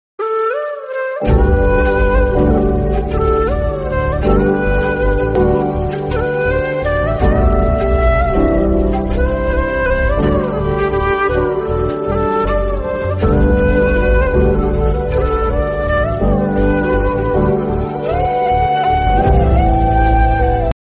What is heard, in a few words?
love bgm